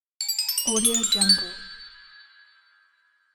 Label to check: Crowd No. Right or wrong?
Wrong